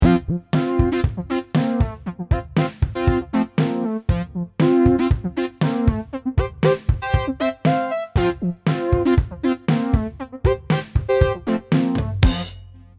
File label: ukulele; Rainbow Ukulele